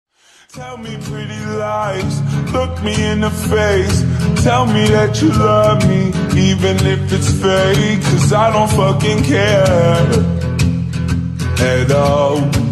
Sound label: tiktok remix slowed reverb